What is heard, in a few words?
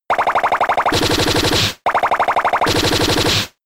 Bullet Seed 2hits; Pokemon; Gen 5; Blk Wht Blk2 Wht2